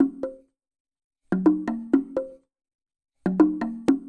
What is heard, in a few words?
percussion loop